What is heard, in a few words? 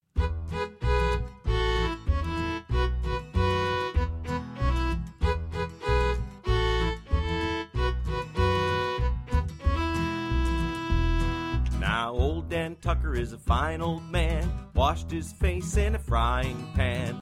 old dan tucker vocal; folk